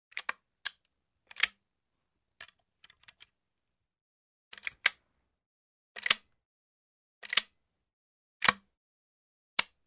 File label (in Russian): zarjadka vintovki